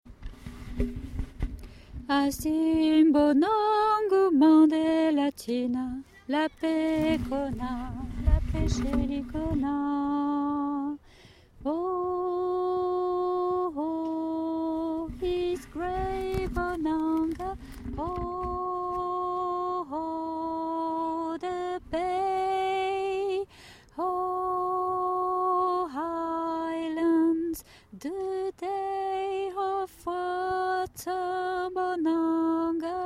alto

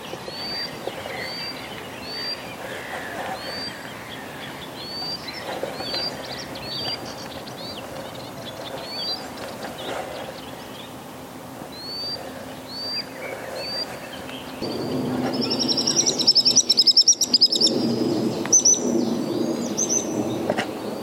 chevalier guignette

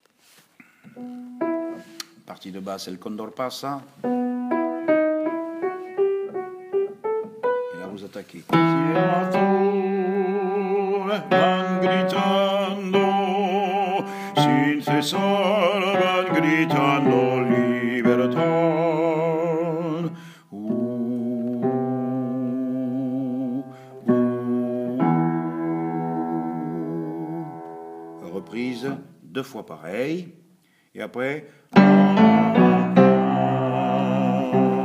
basses

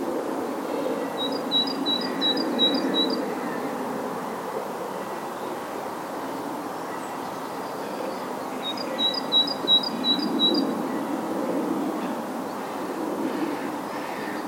mesange charbonniere

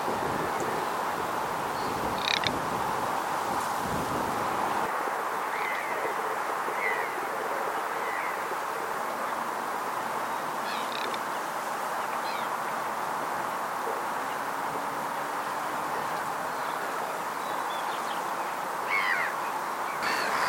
sarcelle ete etang

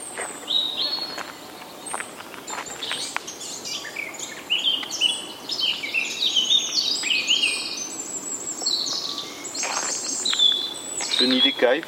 passereaux; lesigny

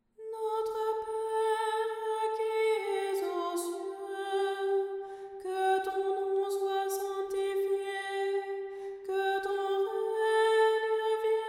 voix 1; notre pere rimsky korsakov

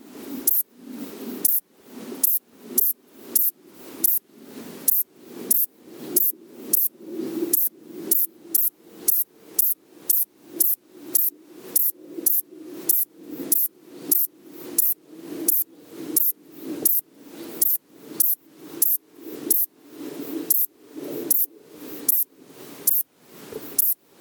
éphippigère des vignes

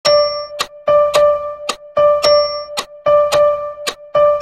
motifs rythmiques mesure

